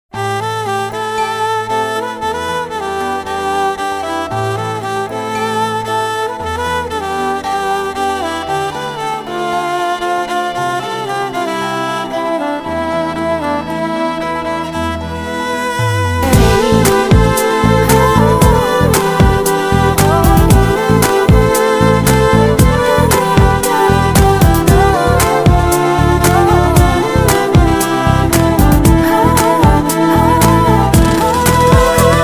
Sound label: tamil love ringtone song bgm ringtone